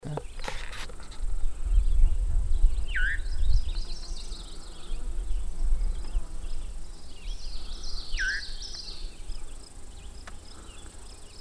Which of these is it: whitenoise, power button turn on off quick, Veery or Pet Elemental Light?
Veery